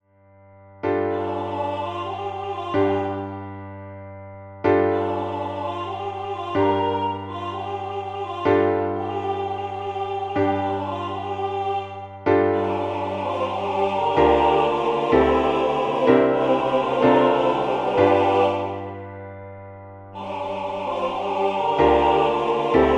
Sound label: MIDI, SATB